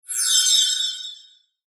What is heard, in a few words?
Shimmer Flange High; High Tone; Blastwave